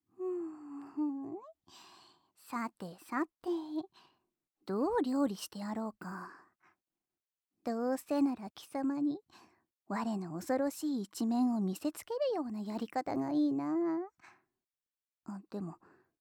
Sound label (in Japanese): 目隠ししながら耳舐めしてやる,ASMR; メドゥーサのメメ子【バイノーラル】～貴様を徹底的に搾精してやる♪～